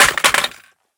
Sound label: riffle drop tile; STALKER; IWP